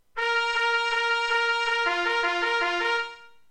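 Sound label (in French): commencez le feu sonnerie clairon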